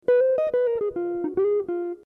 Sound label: blues